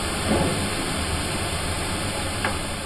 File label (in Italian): Colpo lontano e colpo vicino; Castello Salizzole